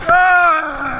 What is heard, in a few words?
scream